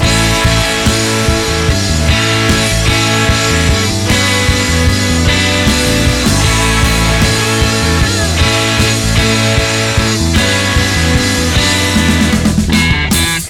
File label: Big Rock Double